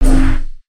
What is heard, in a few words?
scythe swing; weapons; aronwk collection; ndaudio